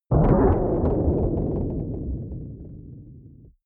protective shield hit absorption rattle; energie

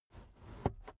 car headrest full adjustment slow

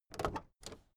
car camaro open door

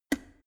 plastic water boiler open lid; maschinen; wasserkocher

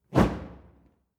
fire torch whoosh short; swooshes-swishes

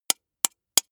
soda can tap finger; sport freizeit

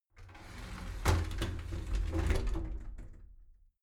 shower slide door inside close soft

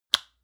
lotion large lid close hard; berufe; friseur

